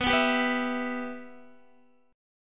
B Suspended 4th Sharp 5th B down Guitar, Bsus4#5 chord; strummed; JGuitar